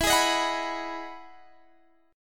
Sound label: E Major 9th E down